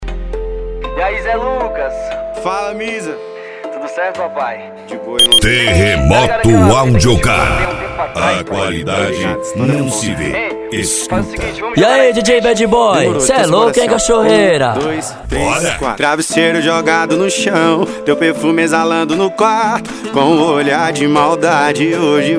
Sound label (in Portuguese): hip hop nacional